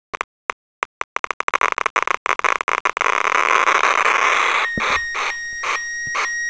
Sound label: Beep, geiger counters